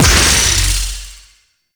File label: Archer Disengage Ability Explode; Warframe; Duviri; Dax Archer; Abilities